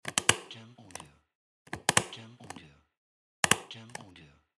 Stapler Paper

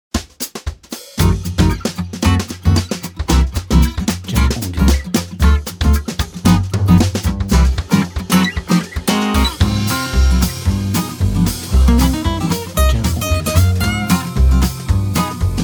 Jazzy Intro

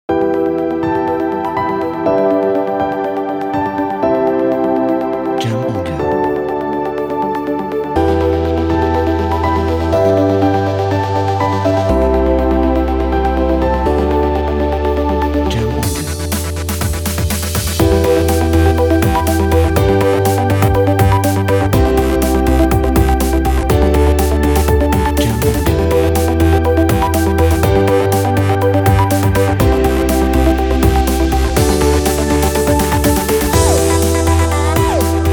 Uplifting EDM Tech

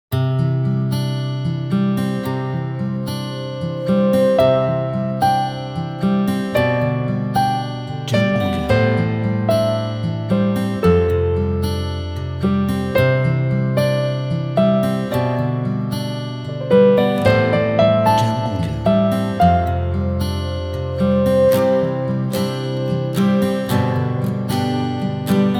Warm Summer Guitar

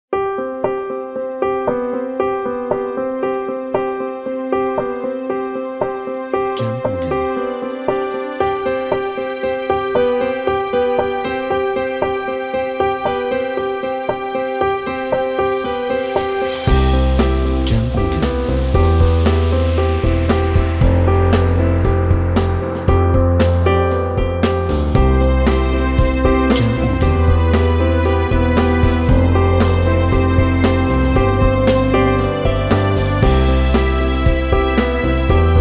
Success Corporate Background

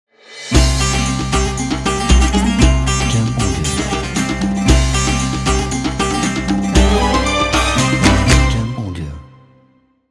Ramadan Logo

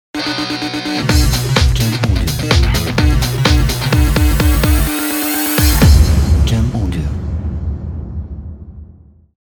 Rock Energy Logo

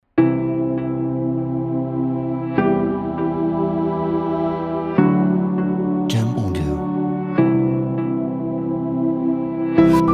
Ambient Future

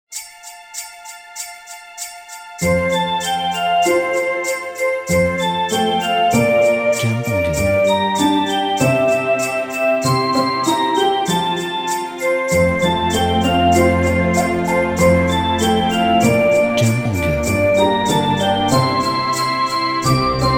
Jingle Bells Intro

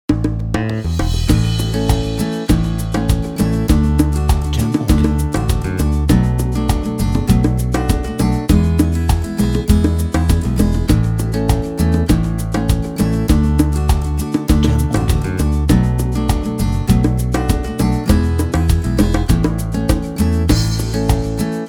Positive Music Background

